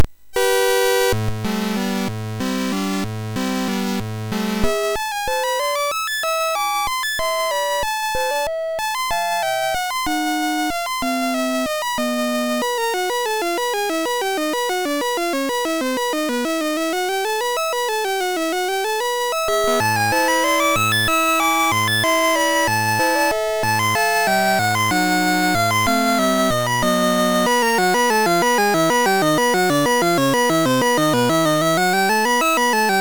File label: sid MOS6581R3, GAMES; Elidon